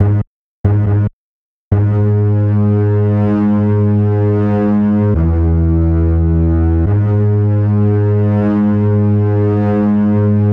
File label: bass